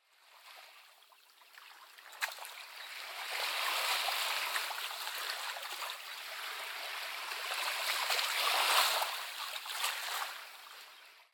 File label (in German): Wellen am Seeufer; Wasser; die quelle des lebens wasser